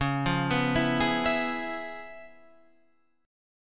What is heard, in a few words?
C sharp 7th Sharp 9th Guitar Open F, chord; arpeggiated; C#7#9; JGuitar